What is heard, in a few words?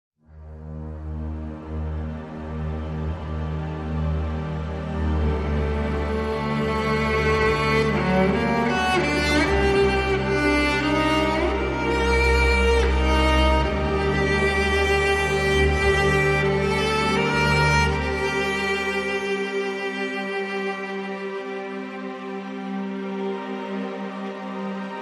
Strings and Pads